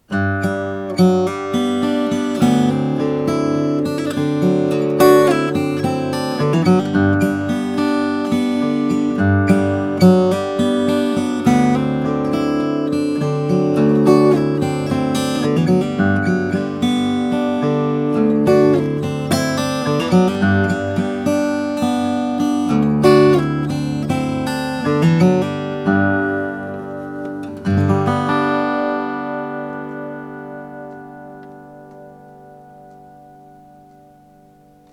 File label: guitar